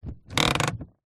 car hand brake lift set ratchet clicks toyota rav4; vehicles